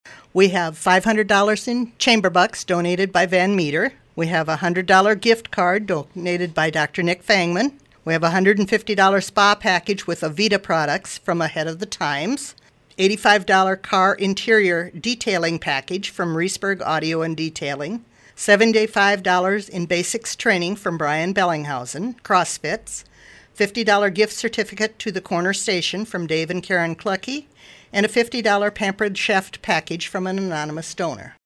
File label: Strings